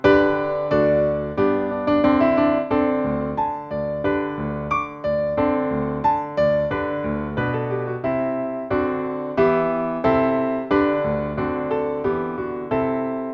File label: accomp; ye mariners